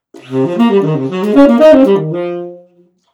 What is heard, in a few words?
minor third up